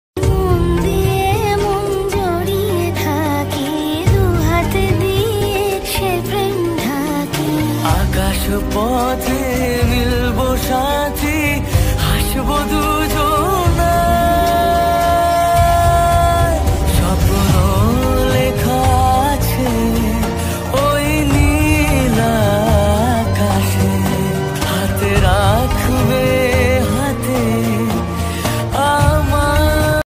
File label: bengali ringtone